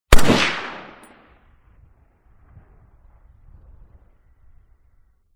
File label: M1 Rifle; SOUND EFFECTS1